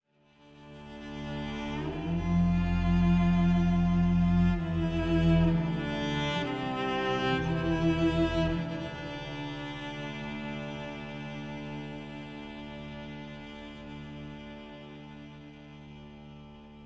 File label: cello interlude unison all; Tyranny Gold Edition